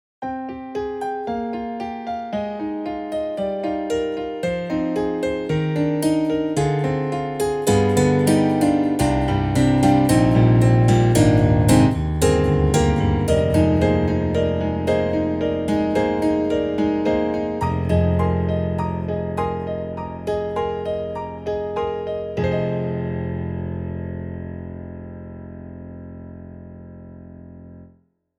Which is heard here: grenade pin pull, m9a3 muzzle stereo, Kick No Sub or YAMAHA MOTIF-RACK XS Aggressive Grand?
YAMAHA MOTIF-RACK XS Aggressive Grand